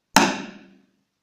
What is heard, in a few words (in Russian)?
Удар барабанной палочкой